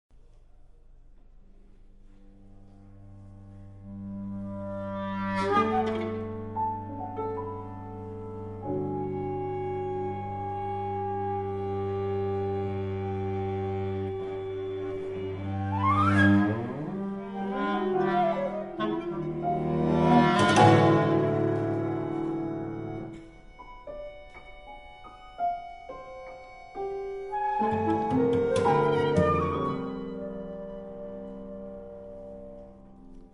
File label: Miniatures For Quintet